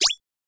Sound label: cursor, button